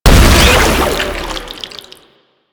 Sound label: Volatile Runner Explosion; Enemies; Abilities; Proto Infested Volatile Runner; Warframe